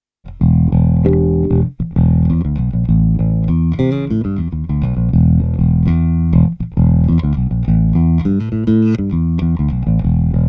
Rupert Neve Designs Portico 5017 Bass DI Mic Blend